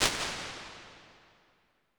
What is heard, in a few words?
3.9s Reflective Verb, Large Spaces, Rooms, Impulse Responses; Apple